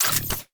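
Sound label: attack archet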